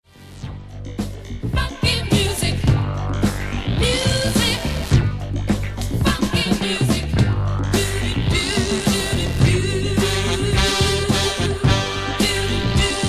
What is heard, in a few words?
Funky Music; funk soul